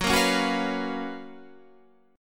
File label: F sharp Major 11th F sharp, Guitar Standard; strummed; JGuitar